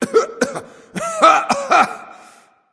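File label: non-verbal clear throat; smite; voicepack; houyi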